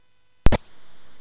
Space Gun

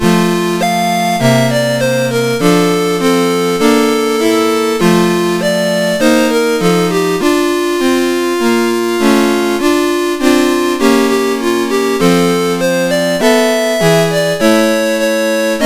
Christmas Song, MOS6581R4

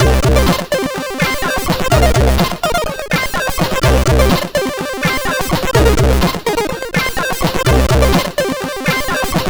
Fade In Intro, CSG8580R5

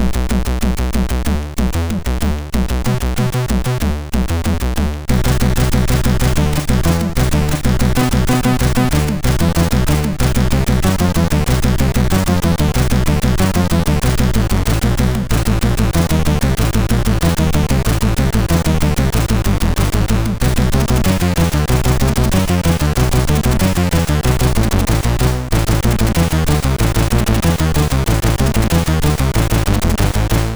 Short Techno, sid, MOS6581R3

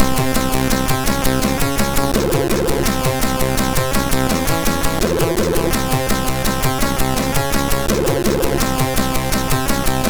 Rock, sid MOS6581R4

